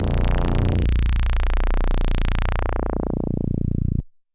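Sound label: DX100 A#0; rocket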